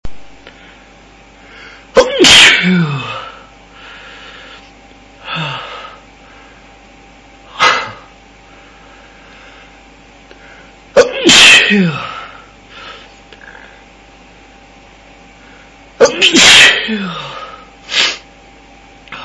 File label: attack, male